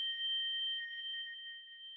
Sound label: sonar Tail Water Far Beacon; Barotrauma; Real Sonar